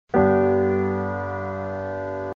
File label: Tonic Chord